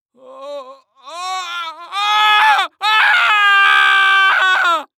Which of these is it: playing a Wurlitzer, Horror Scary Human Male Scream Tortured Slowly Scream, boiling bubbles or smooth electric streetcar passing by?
Horror Scary Human Male Scream Tortured Slowly Scream